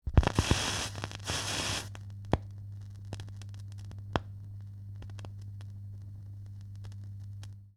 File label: Vinyl Needle drop